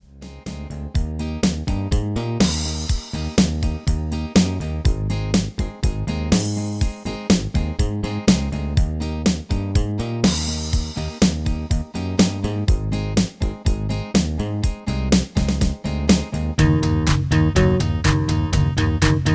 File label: MIDI